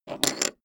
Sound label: poker chip stack small fall down hard